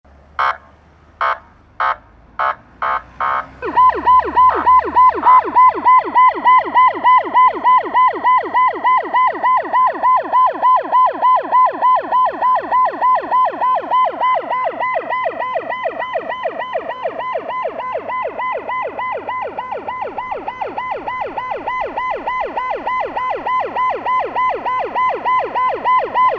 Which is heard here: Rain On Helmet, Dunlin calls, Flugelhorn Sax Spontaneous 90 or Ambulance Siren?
Ambulance Siren